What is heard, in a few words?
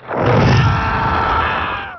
warp start; PC Gamer IT CD 41; Daikatana